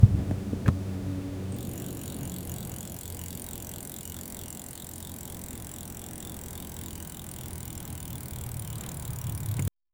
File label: sound of bike falling, bicycle